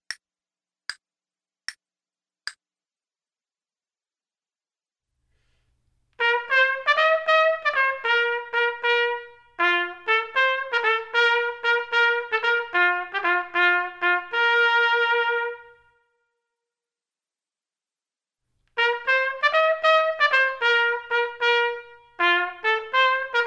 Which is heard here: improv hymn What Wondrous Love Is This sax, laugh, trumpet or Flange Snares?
trumpet